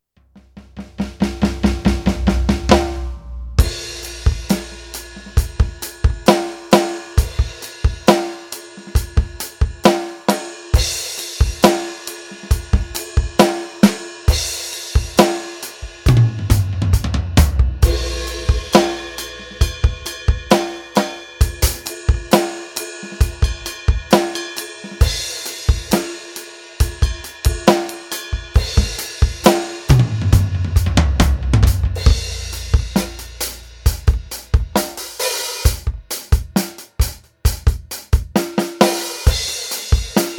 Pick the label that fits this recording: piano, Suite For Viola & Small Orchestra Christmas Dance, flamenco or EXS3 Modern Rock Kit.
EXS3 Modern Rock Kit